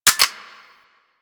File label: UMP Mech; Warframe; Weapons; Corpus